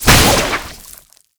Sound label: Alt Fire Tether; Weapons; Warframe; Tenno; Oraxia